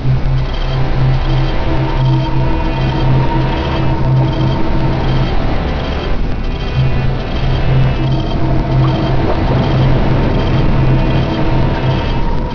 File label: drone